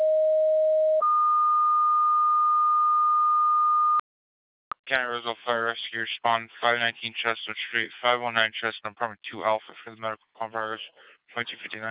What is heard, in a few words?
two tone